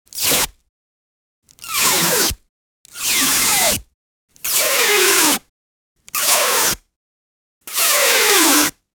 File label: a rapid descent the sharp, rope